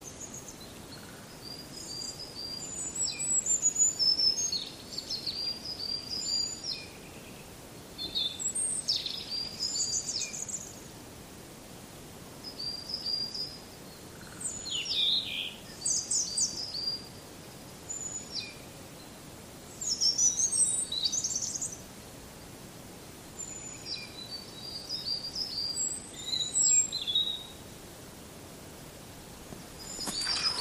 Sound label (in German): Rotkehlchen Tannenmeise